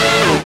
SYN TECHN, dance synth; Sound & Vision - Gigapack I CD 2

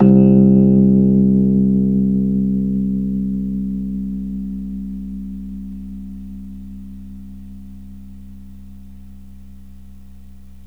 Rhodes C; 3D Sound; 3D Keys VOL-1

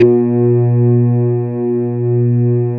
ESQ 1 E-BA, BASS; Soli-Music; World of Synthesizers VOL-1

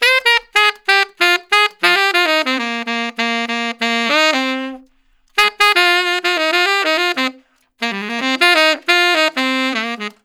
Tenor Solo, Jazz, Traditional, Tenor Sax

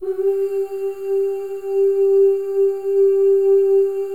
FEM OOS, Symphony of Voices

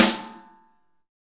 SN ambient; Best Service; Real Mega Drums VOL-1

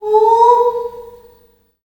DOVECALL, Heart of Africa, BIRDMEN; Spectrasonics

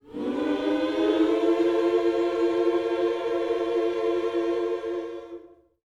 MM MINOR, Gospel; Spectrasonics; Vocal Planet; MIN. SCOOPS

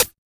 Closed HH

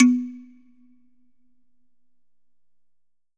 slit drum, drums percussion

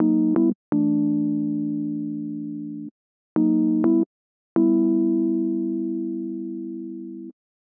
125 Rhodes Dom A, Keys, Nu Jazz House; PowerFX - Nu Jazz House

